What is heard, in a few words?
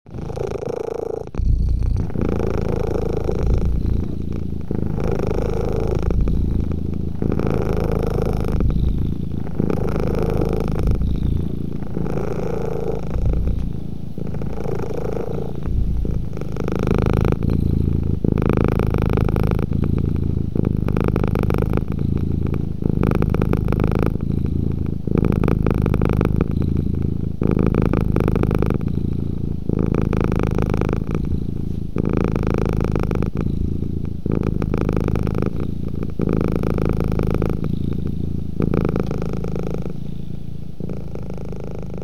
Cat Purring Raspy & Breathy